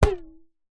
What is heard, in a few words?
toy inflatable hammer hit impact high pitched ring